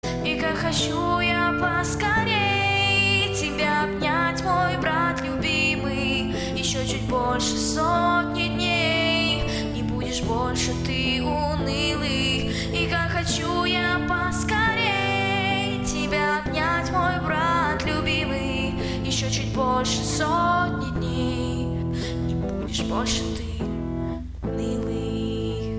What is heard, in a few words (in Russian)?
zvonok na sestru gitara